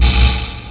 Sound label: bounce